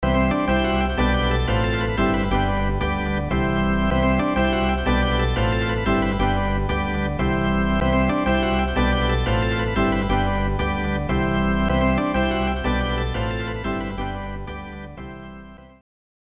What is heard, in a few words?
battle clear; neo; Scoreboard